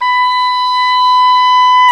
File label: OBOE, mf f; Roland L-CDX-03 Disk 1; Oboe 2&3